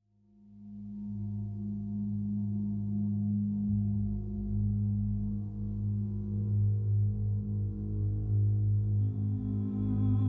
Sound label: instrumental; Gladiator; آهنگ های فیلم گلادیاتور